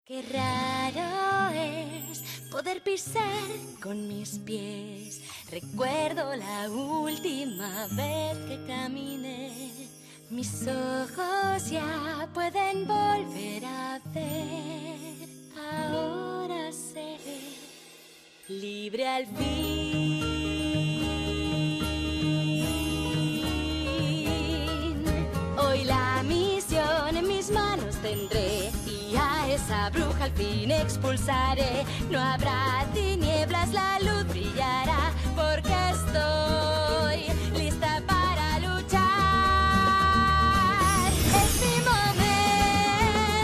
female spanish sing movie; ideasonora

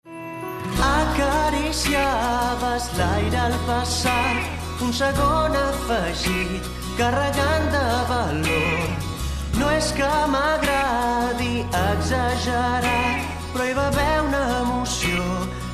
male catalan sing comercial; ideasonora

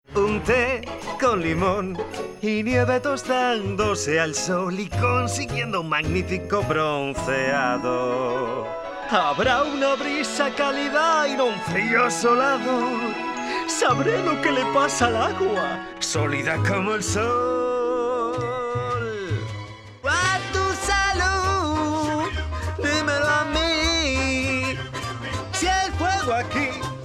male spanish song movie; ideasonora

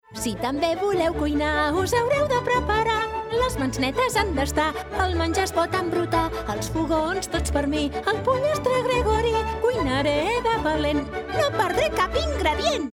female catalan song

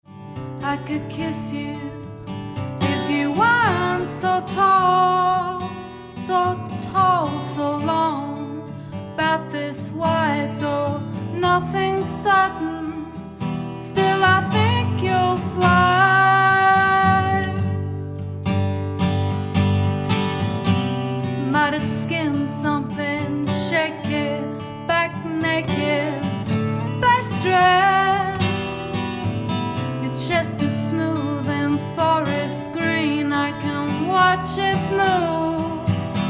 female british english sing comercial; ideasonora

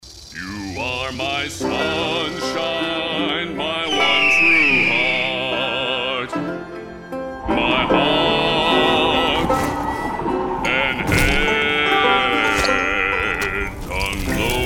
male american english sing comercial; ideasonora

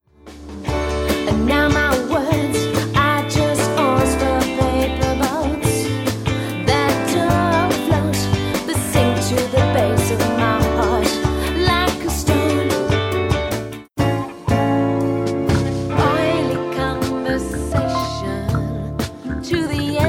female british english sing comercial; ideasonora

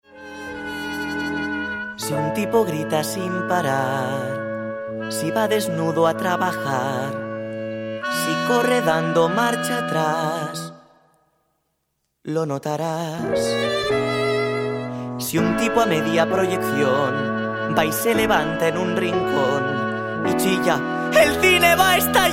male spanish sing comercial; ideasonora

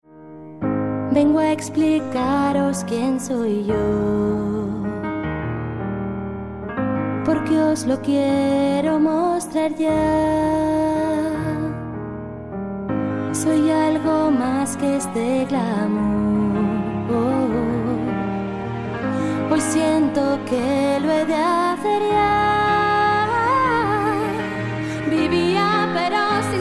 female spanish sing kids comercial; ideasonora

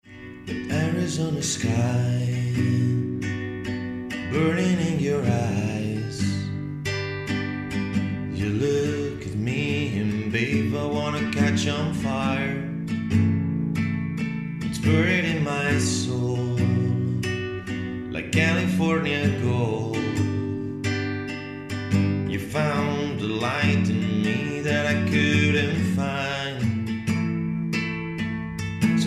male non-native english sing comercial; ideasonora

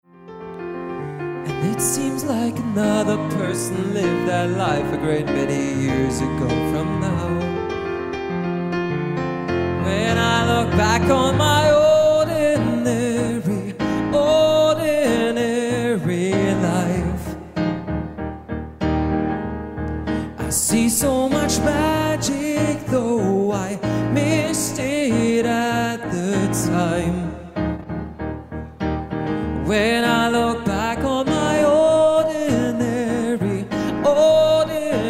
male non native english sing; ideasonora

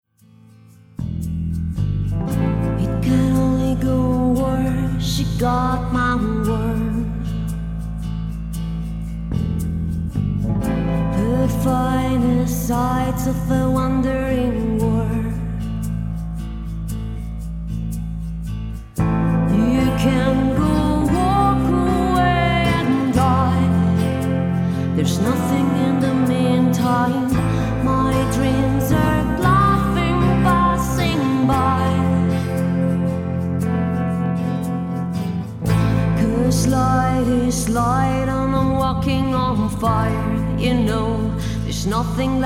female non-native english sing comercial

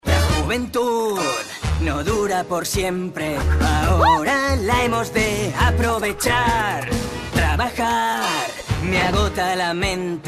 male spanish sing comercial; ideasonora

